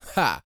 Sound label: LAUGH, Vocal Jazz Fx